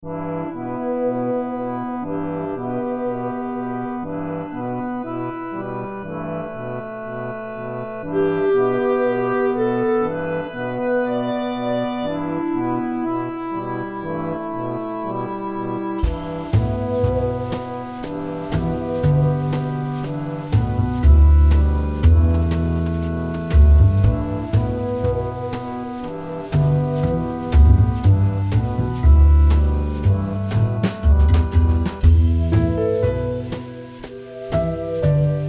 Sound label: Kitchen Slink; Noisebiscuits